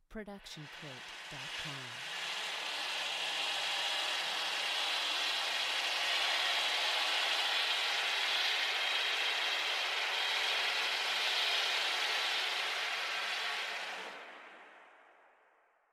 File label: Eerie Scratching Noise; SoundsCrate